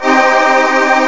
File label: Connect; internet installer